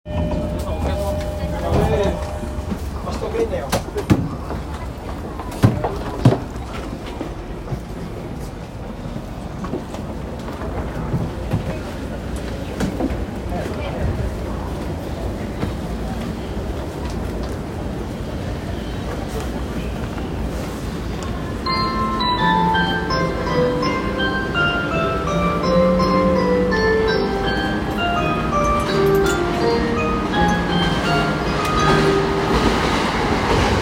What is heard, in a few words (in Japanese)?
JR東海道線横浜南, train sounds